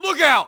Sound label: yell; Matt Hoffman's Pro BMX 2; XBOX